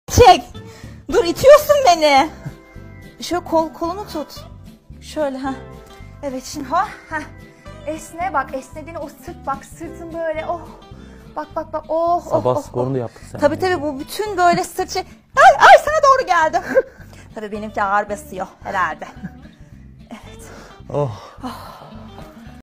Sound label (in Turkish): oh oh diyorr